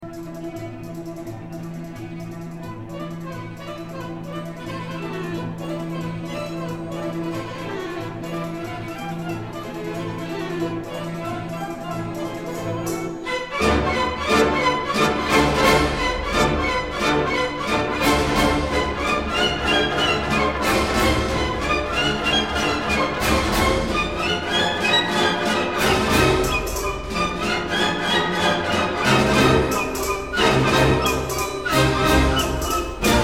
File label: Orch